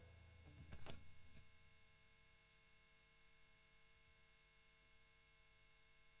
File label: E.GTR OD; Gefell